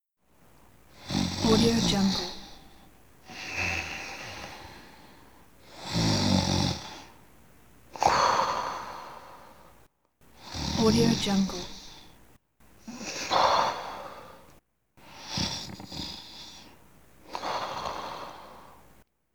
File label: human male snoring